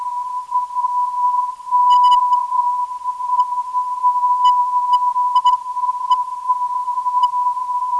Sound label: 910kHz, NDB